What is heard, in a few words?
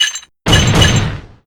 light slam laughing